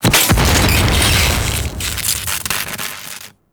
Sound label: Security Trap Electric Explosion; Grn Forest; Veilbreaker; Grineer Forest Thumper; Kahl